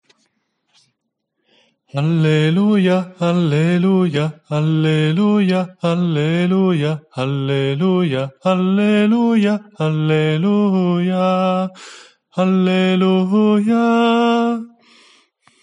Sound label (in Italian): alleluja date lode ritornello finale tenori, coro